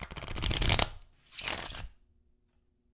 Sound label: Shuffle; Princess & Conquest v0.20.06